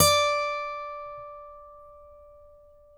ACGT D5, ACCGTR PICK

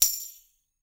TAM, NYC Percussionworks; Sampleheads; 90 s Sample CDs

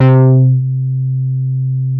DETUNE BASS, VINTAGE VOLTAGE

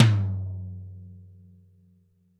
TD-20 Tom A, Roland TD-20 Toms

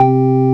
DISCO ORGAN; Techno Trance

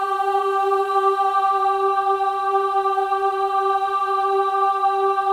OH G4, WOMEN OHS, Symphony of Voices; Spectrasonics

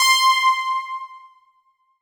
Roland JV 2080, interval; MG INTERVAL

